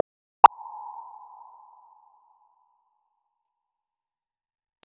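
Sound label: three step ascending electronic, percussive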